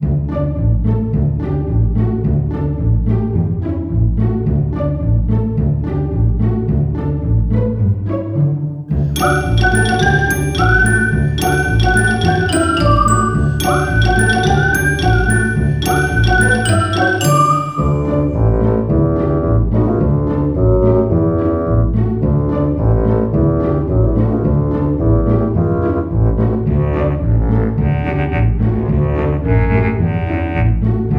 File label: music school